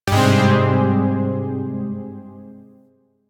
Shawzin Phrygian Chord K; Zariman Shawzin

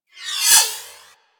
Swarm Cannon Projectile By

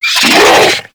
Infested Charger Alert, Enemies; Warframe

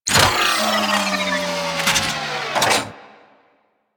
Rolo Dex Close, Ship; Ambience; Props; Warframe; Corpus Ship Remaster

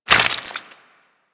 Proc Bleeding; Mods

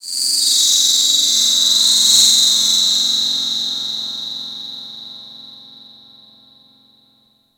Cephalon Tech Texture Small, Ambience

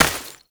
Step Dirt, Foley, Footsteps; Enemies; Warframe; Duviri; Dax

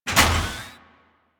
Ship Fighter Platform Start, Corpus Ship; Warframe; Corpus Ship Remaster

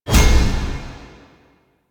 Rally Cast Peak; Warframe; Warframes; Hoplite

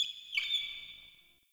Under City Alley Critter

